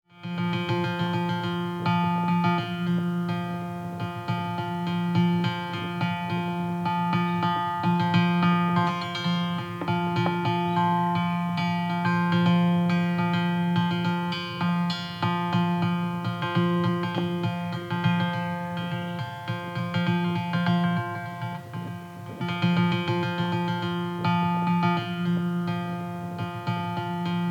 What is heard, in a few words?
leaf harp rhythm